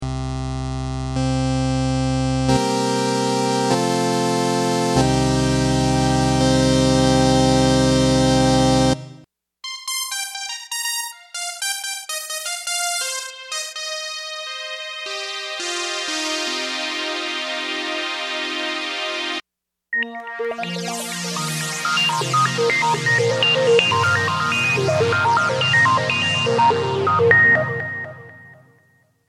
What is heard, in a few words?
Synth